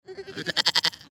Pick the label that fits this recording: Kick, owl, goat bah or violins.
goat bah